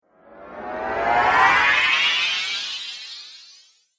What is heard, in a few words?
Ghost Whoosh Appear And Vanish